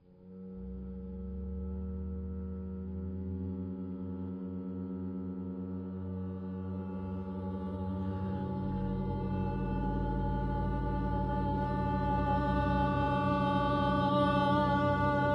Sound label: erhu and vocalization experiment; weeklybeats 2026